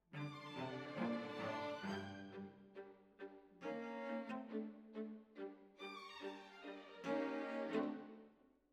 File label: Cellos Over